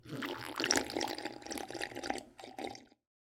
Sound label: slurp